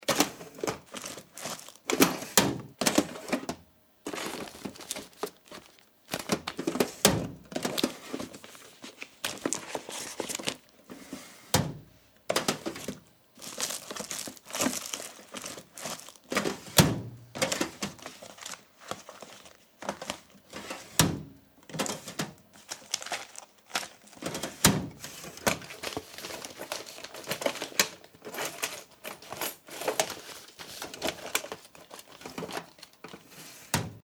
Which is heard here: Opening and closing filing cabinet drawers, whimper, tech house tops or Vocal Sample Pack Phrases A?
Opening and closing filing cabinet drawers